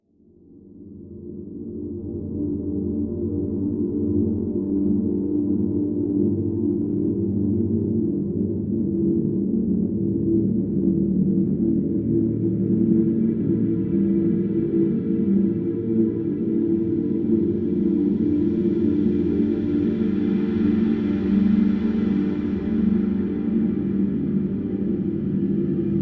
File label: Caves Exploration; Skellige